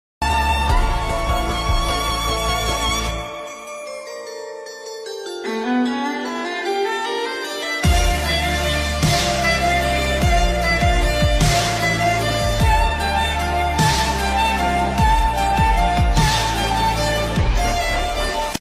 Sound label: violin; carol of the bells